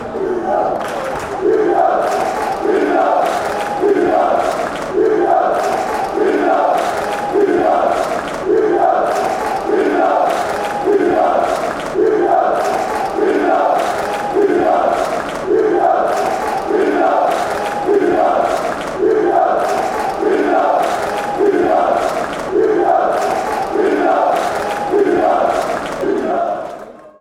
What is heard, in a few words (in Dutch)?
lokeren zingen, chants